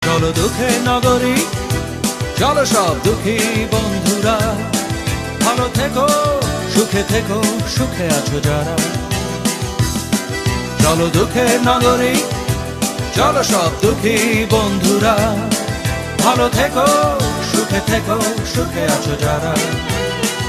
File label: Bangla 90s hit Song